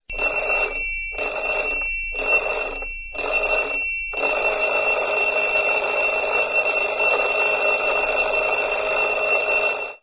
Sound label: Rushed Alert, alarms